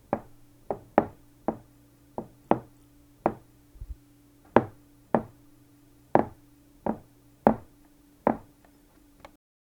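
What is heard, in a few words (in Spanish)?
paso único sobre tabla de, footstep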